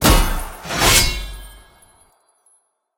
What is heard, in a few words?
scatter Lvl 2; conan